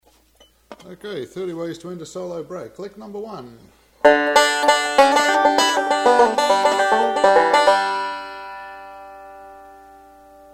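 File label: banjo licks, bluegrass banjo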